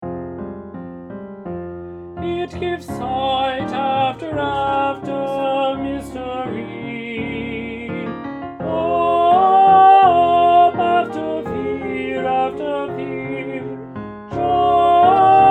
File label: Alto Predominant